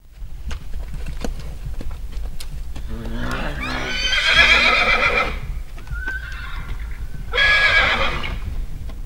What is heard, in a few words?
Horse Whinny Trott